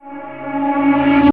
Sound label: Crystal Chorus Poof pre cast